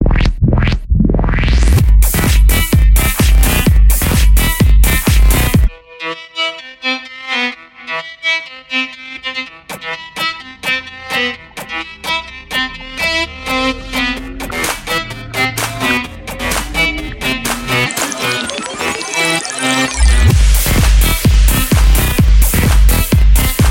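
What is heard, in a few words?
english background music ringtone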